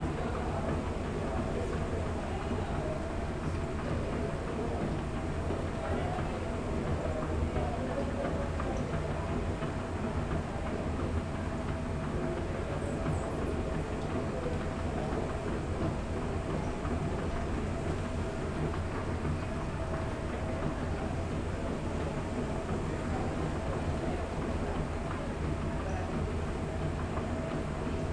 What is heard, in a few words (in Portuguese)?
Ambiencia tunel do tempo escada rolante com pessoas; tunel do tempo do congresso nacional